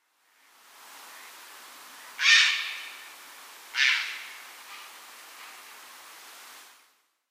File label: grey heron ardea cinerea; Dawid Jablonski; Xeno-canto